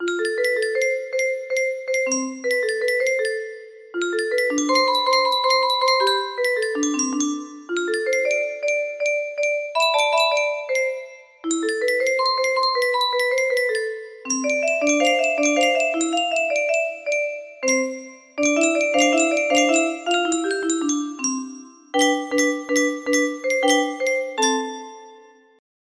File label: music box, music box melody